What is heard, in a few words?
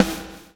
BLOW; Spectrasonics - Backbeat